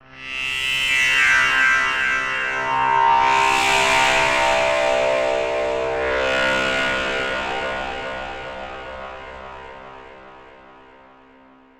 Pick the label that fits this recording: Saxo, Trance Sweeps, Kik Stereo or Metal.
Trance Sweeps